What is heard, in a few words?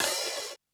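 open hi-hat; eddyflux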